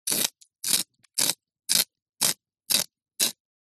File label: sharpening new pencil with small plastic sharpener